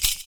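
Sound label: PERC, percussions; Univers Sons - Raricussions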